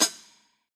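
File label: closed hi-hat; eddyflux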